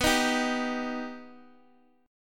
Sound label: B Augmented, Guitar, Open D